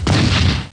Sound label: shotgun